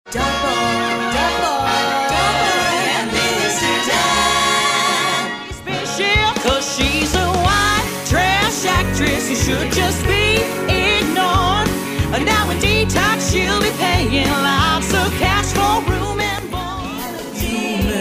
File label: SINGING